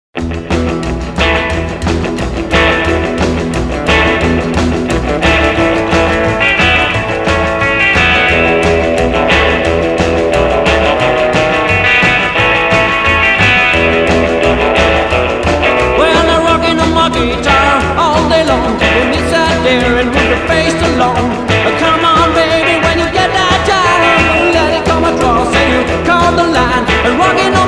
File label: guitar rock; Rock’n’Roll